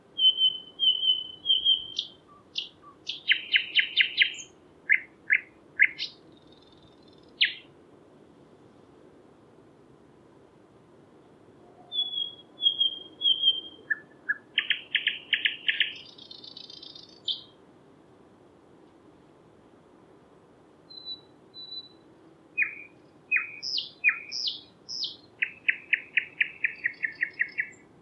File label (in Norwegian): nattergal